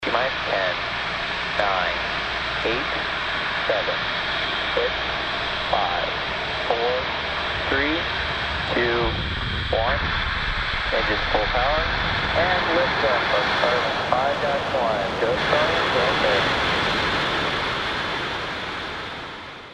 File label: SFX LAUNCH